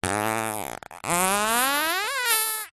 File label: cartoon fart